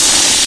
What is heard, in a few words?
charge loop, antlion; Source Engine; video game; npc